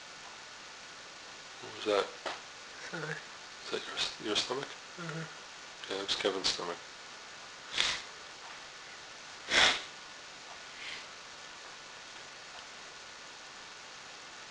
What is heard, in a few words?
evp plink